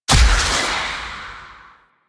Zeal Pre Teleport Cin Stun; Warframe; Emissary Season Two

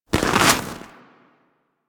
Armadillo Move Snow

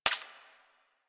Bladed Pistol Mech; Warframe; Tenno

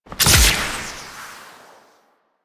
Grapple Throw; Warframe; Teshin; Weapons

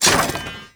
Animal Rescue Cage Locked; Encounters

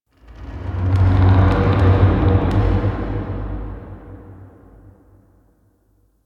Wooden Creak; Ambience

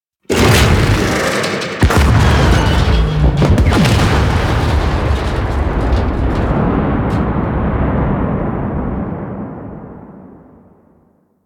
Thumper Jump And Explode; Warframe; The New War; Grineer Eidolon Shotgun

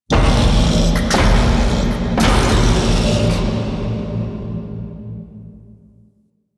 Knock; Entrati Labs

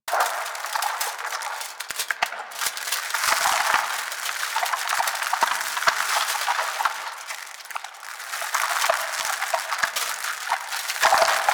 Regrowth Whispy Loop; Props; Warframe; Ambience; Sentient Devourer

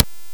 Day Wind Gust Calm; Ambience; One Offs; Glades Of Andurin

